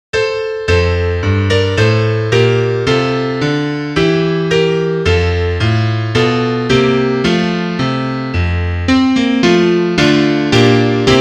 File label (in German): Klavier; Liederbuch; wenn alle bruennlein fliessen